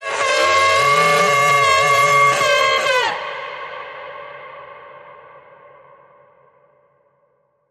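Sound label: creature banshee scream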